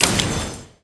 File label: click validation